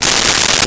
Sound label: Cable Tie; Tools; 1001 Sound Effects